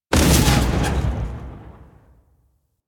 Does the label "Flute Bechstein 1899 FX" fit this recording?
No